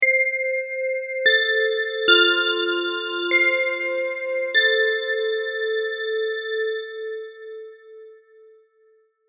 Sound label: Bell; Einfeld